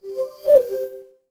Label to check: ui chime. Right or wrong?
Right